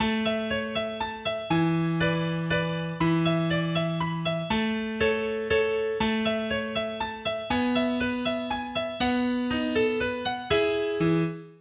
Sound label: Mazurka; Ferrer; 20 mehrstimmige Gitarrenstücke